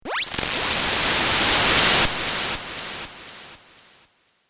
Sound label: Laser Hiss Video Game